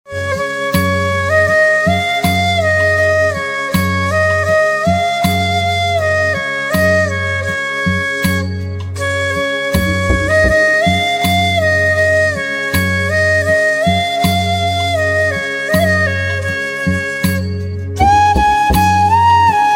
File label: Flute; devotional instrumental ringtone